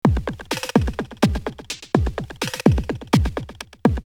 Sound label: 126, drumloops Techno&House; X-Static Goldmine; 90 s Sample CDs